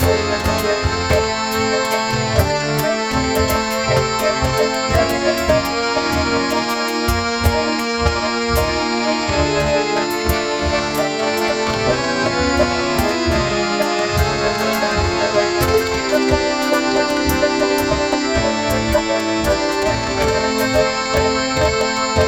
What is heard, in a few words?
playful circus inspired f, carnival